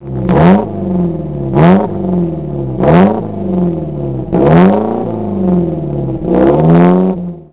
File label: giulietta sprint, classic car